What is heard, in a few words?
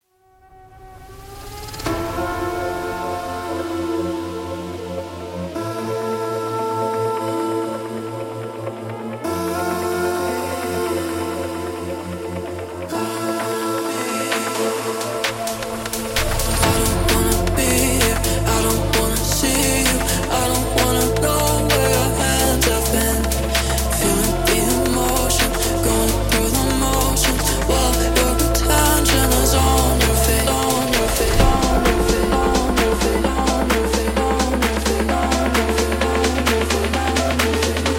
Construction Kit Full Mix